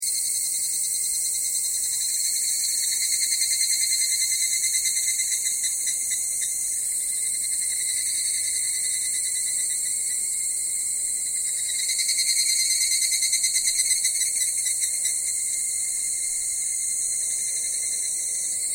Ambience Takao Summer Insects, Mount Takao west of Tokyo; Loop Stereo; Forests and Jungles